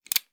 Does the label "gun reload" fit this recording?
Yes